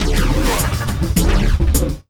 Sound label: Bumpers; Sonic Foundry (Sony Creative Software); Esoterik Beatz; Shaj Fx